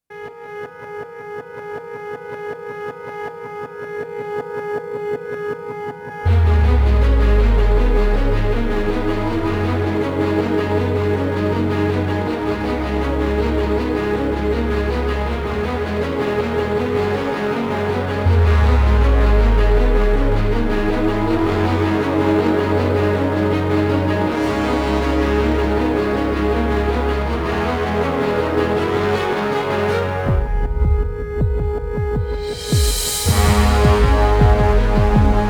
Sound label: Search For Sunrise